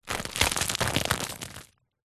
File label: food bread baguette dense crispy rip tear in half